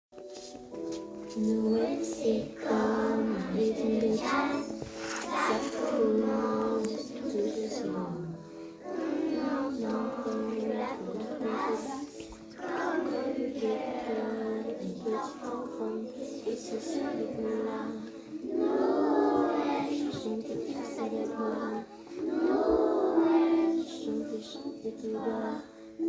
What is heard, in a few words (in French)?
Noel jazz; les gs en choeur pour noel